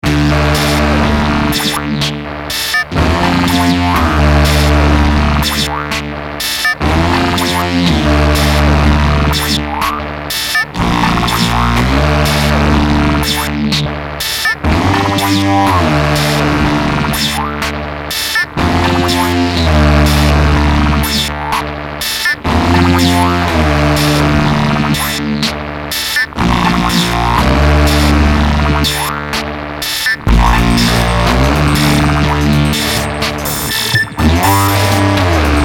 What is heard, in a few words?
Electro